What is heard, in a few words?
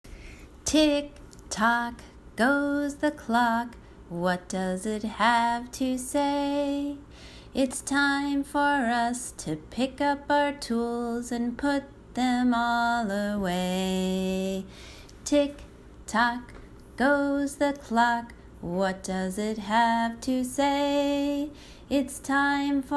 Cleanup Song to clean up our space